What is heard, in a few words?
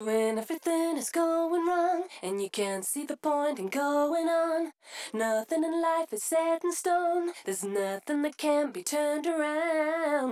Vocal